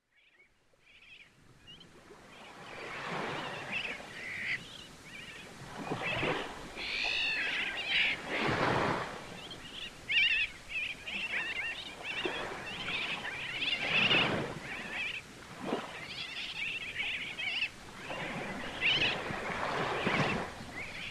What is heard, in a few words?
seabirds - sooty terns - noddies with waves in a reef